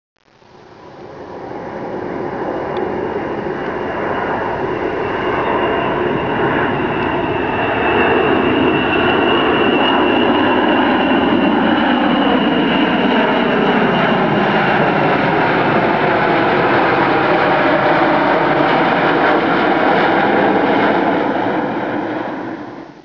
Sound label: B-52G; Boeing B-52; Castle